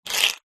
foley money australian 5 cent coin slide short on tiled table; zapsplat